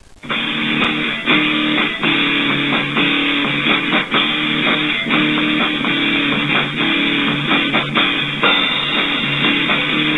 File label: guitar